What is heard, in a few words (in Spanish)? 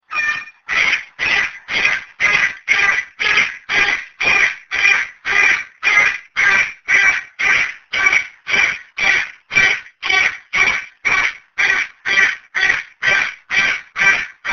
cacatua